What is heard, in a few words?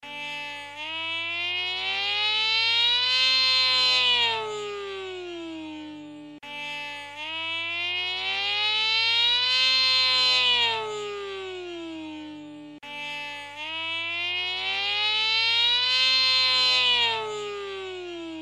Alarm Reverse Slow